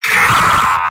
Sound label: sniper pain severe; vo; tf2; mvm